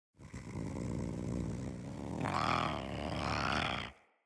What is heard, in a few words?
Feral Vocal Idle; Catbrow